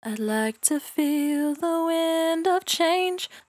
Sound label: 68bpm D, Velvet Vocal; Zero-G